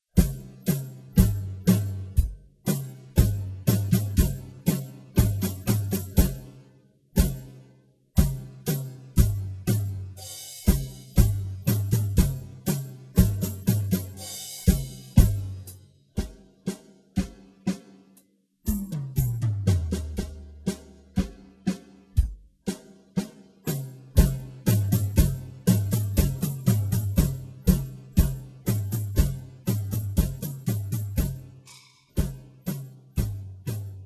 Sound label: determined drum